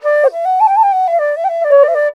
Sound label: INDIA ALTO